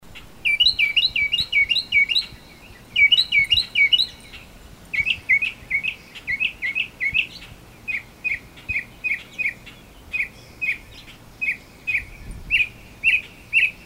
Northern Mockingbird Mimus polyglottos polyglottos